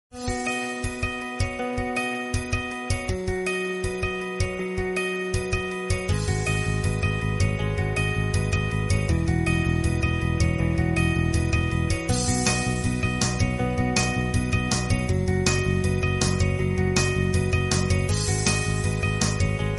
Landscape Infinix Ringtone

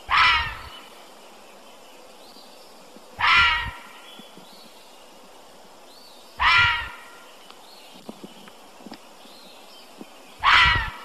Fisher Cat Sounds

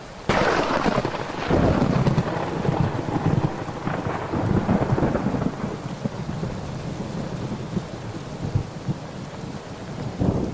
Heavy Rain Sound

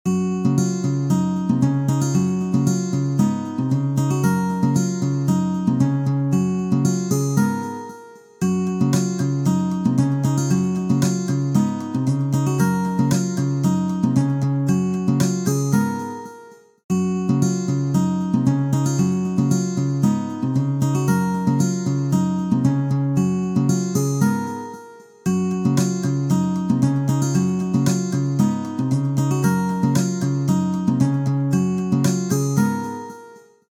Infinix Note 30 Ringtone

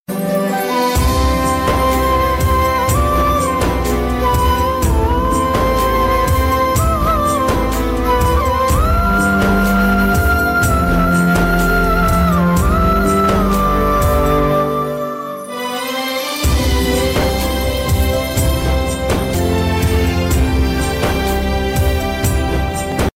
Latest Flute Ringtones

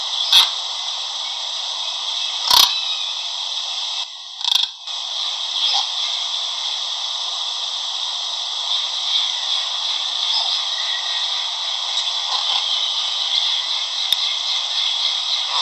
Macaw Sound Ringtone

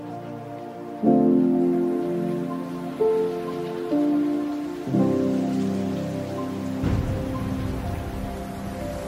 Rain Sleeping Sounds

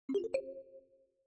Infinix Hot 40 Ringtone

